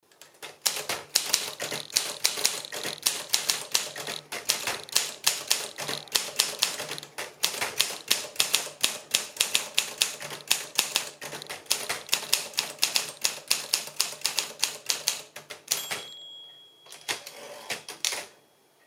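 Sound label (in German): olympia modell sm2, Schreibmaschine; Hörbeispiel Olympia Modell SM2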